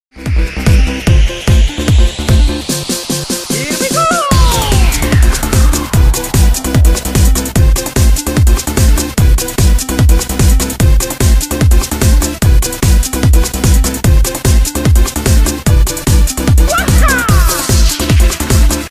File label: Powerup Music; fashion police squad beta